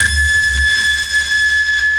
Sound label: sonar Ping Water Medium Shuttle Directional; Barotrauma; Real Sonar